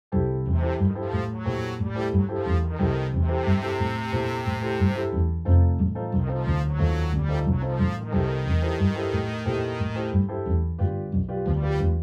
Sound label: MIDI blues; spellman studio